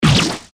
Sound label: attacked